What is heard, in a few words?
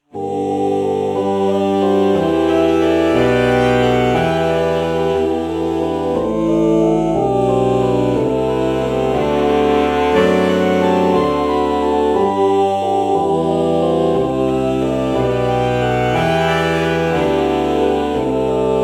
alto saxophone oh morph